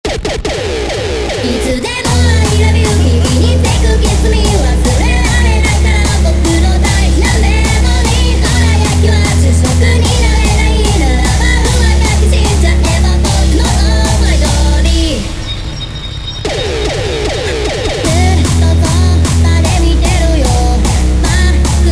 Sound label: Jumpstyle, funk